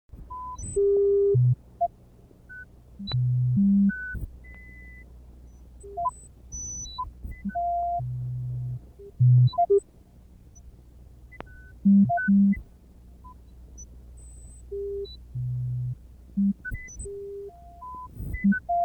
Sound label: Sine Music; Electronic